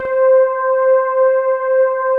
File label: MUTE F PADS; Pro-Rec - Synthsonic